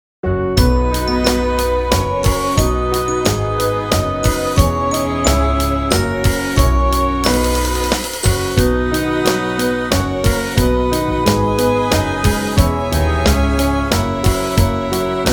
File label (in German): O Tannenbaum Playback